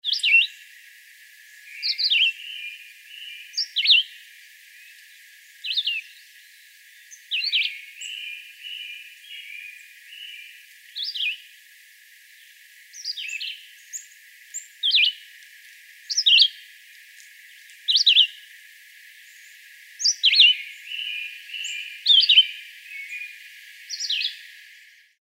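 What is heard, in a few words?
Red-eyed Vireo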